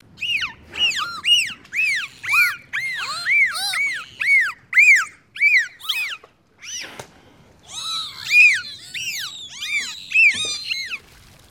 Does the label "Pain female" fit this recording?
No